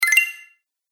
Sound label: Magic Tokens Game Fx; Video Game Sound Effects